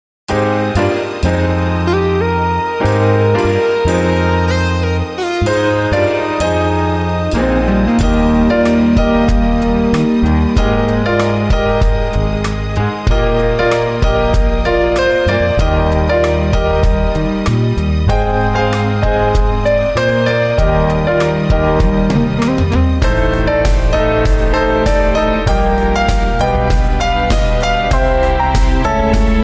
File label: Guitar Music; Ringtones; Hill Street Blues